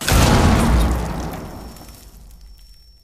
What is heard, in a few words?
C4 Boom